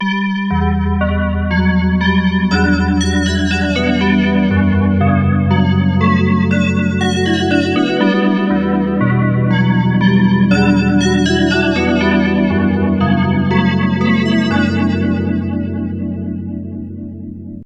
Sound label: DX7 bells, destination rotation